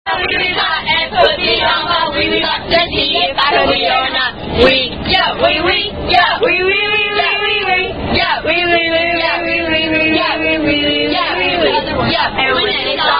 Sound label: The Wee Wee Song Sing-A-Long, Children On Bus Coming Back From Camp